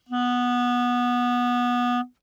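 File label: Eb Clarinet fortissimo B3 stereo; Woodwinds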